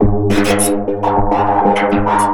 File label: NRG SFX, Trance